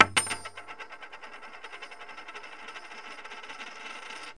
big coin; Wired for Sound Pro CD; Aristosoft, Inc.

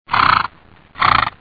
SNORT; Equivision: Polo Thrills

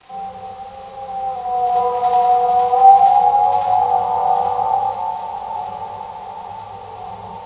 moan; Quake; PainKeep

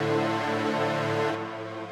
SYNTHPAD HOUSE 125 A; eJay; Sphere

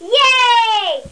Yeay; Let's Discover Michigan!

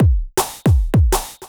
DRUMLOOP D+B 160, Drum Bass Loop; eJay

